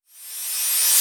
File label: Reverse Cymbals